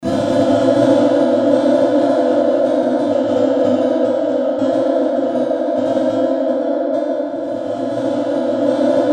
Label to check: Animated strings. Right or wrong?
Right